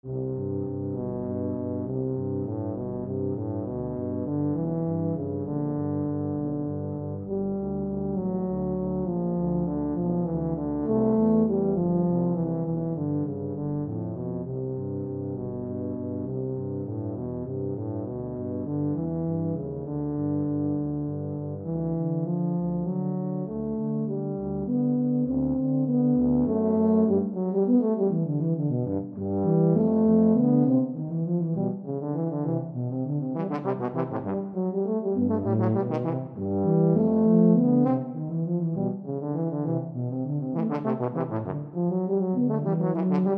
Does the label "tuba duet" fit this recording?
Yes